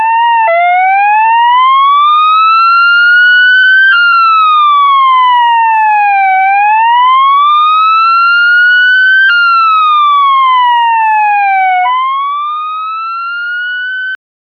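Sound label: nuclear siren nuclear explosion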